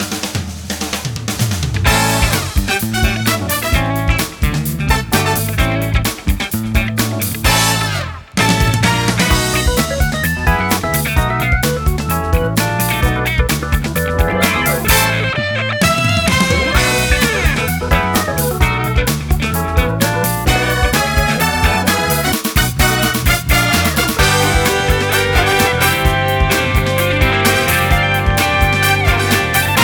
129B

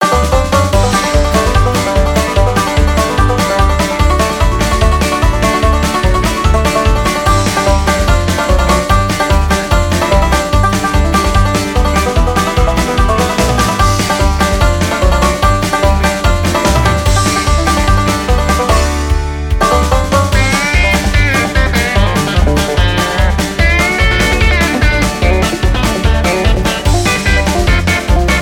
Picking Party; Diamond Mine Productions

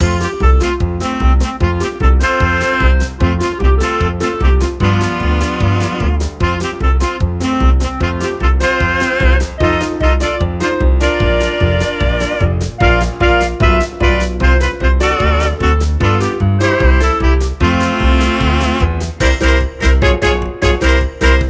150B

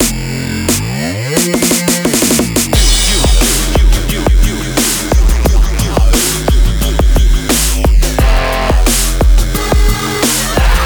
88B

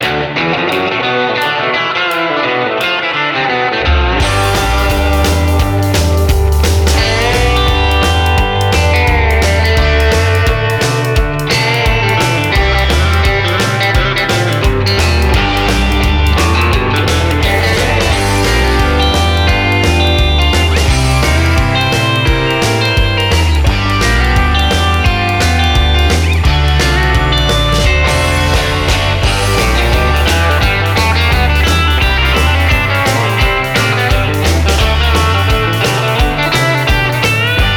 173B

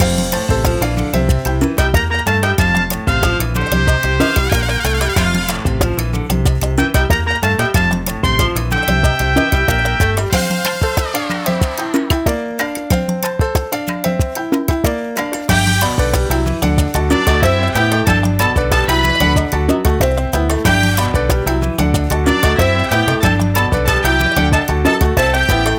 93B

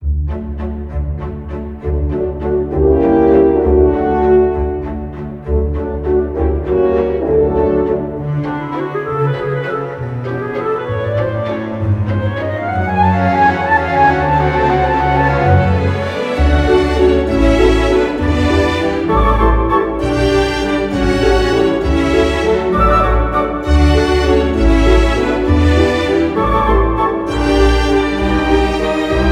Waltz 198B

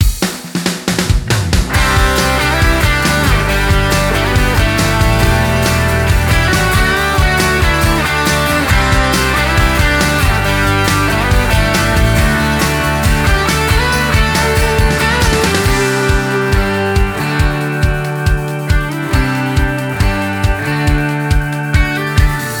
138B

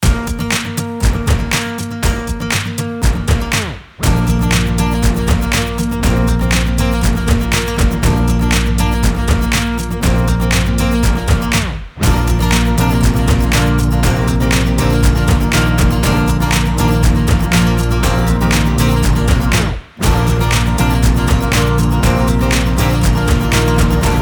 120B

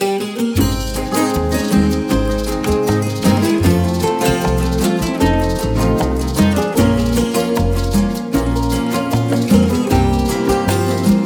Fast 77B

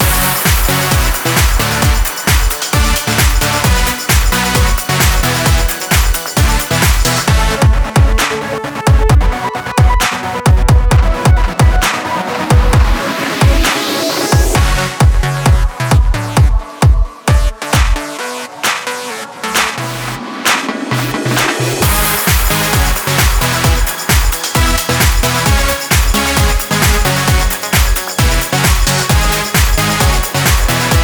Pop 132B

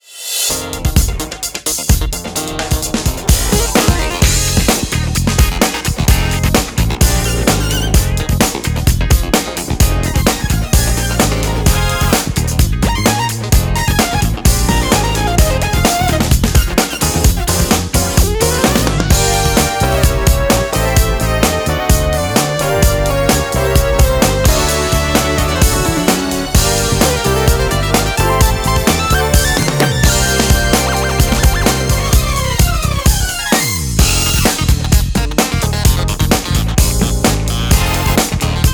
Power Funk; Diamond Mine Productions

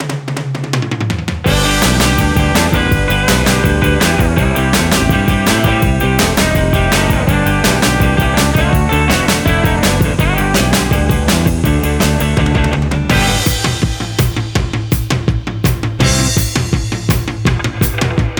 165B

